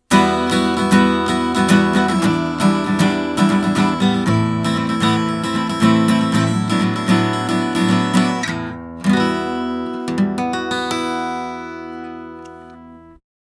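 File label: Steel string Guitar Cardioid; avantone ck-7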